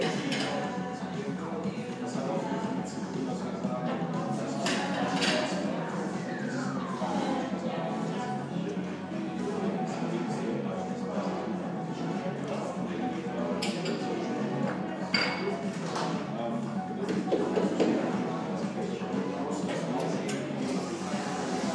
60 seconds of coworking space cafe ambience berlin; betahaus